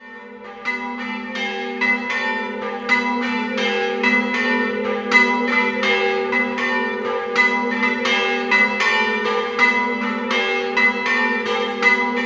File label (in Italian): Cimpello, campane